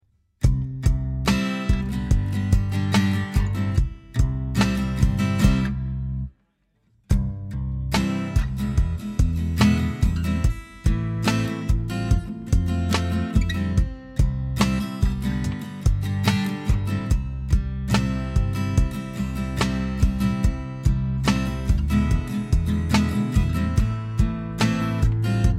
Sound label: Instrumental